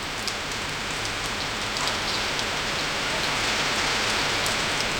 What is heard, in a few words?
pattering rain; worry